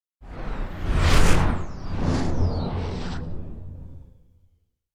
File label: Whoosh Sci-Fi Creature By Speed Down; Hollywood Edge; sound designer's toolkit v2